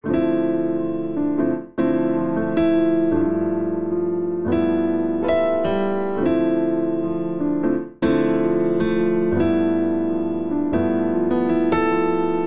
Vamp Block Chords Comping Arpeggiated ii - V C Major 77 bpm, Grand Piano; MIDI Clips; Ableton; Factory Packs